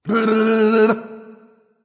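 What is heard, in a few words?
non-verbal tongue; smite; voicepack; houyi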